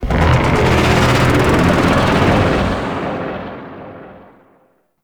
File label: Void Dragon Vocal Idle; Enemies; Vocals